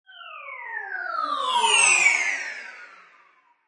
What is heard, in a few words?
Descend Slide Whoosh, Scifi; Scifi Production Elements, Set 1; Science Fiction Sound Effects